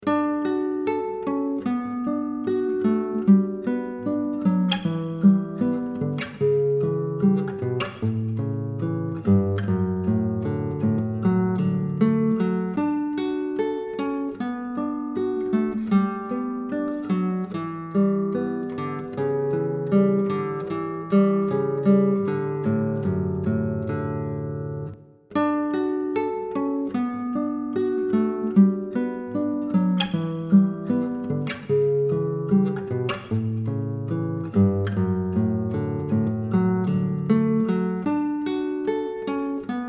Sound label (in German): triad study, Dreiklangs-Etüde, guitar; Akustische Gitarre/Klassische Gitarre: Solo; „Triad Study # 4“